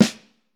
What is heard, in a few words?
Vintage Snare Drum, 3.5X14; Q Up Arts; Johnny.C's Vintage Snare Drum